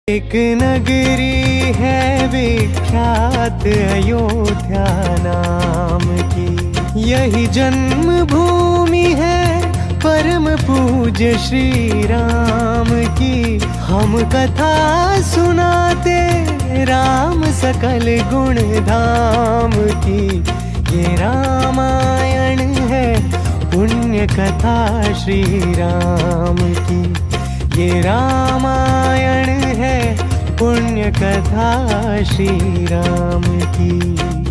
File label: ringtone ramayan ringtone ram ringtone